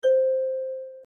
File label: call complete chime; assistant-based warm transfer; calls